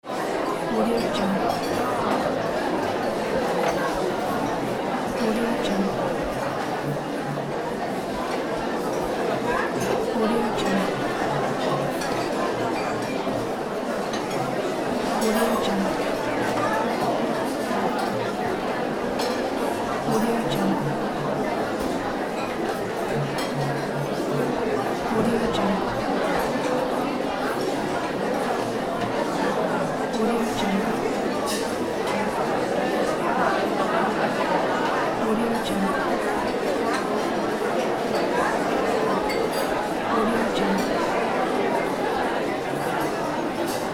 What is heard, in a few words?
bar noise; danielmix